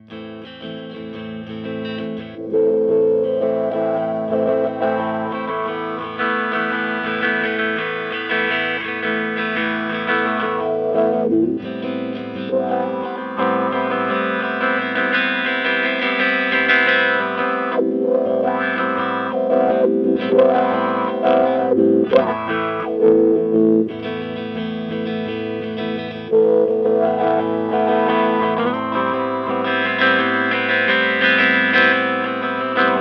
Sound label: Morley Wah Lock